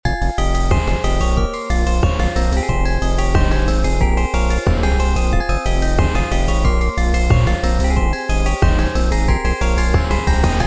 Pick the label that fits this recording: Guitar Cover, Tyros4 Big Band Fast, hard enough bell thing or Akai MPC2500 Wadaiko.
hard enough bell thing